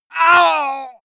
male painful cry

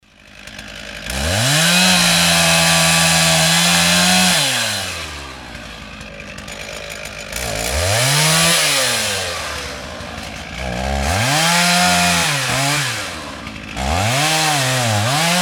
saw chain

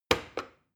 plastic button start click heavy slow

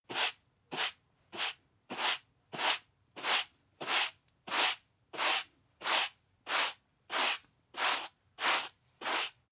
broom sweep sequence long

